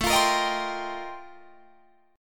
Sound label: F 11th A, chord